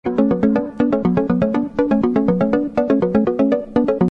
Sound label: melody